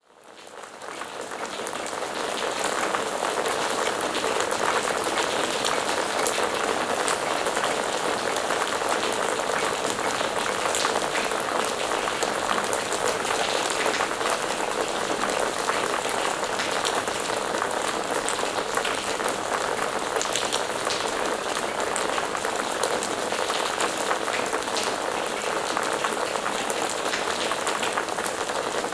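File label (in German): Regensounds Naturgeräusche Schlafmusik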